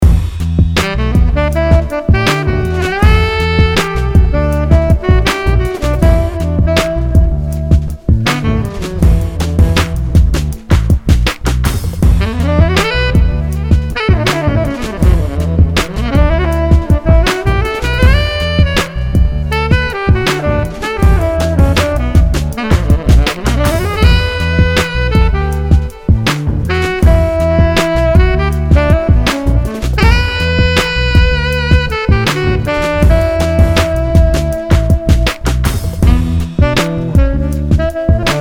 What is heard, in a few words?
Chilled Sax